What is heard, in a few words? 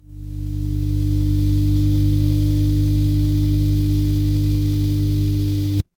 fire electric hum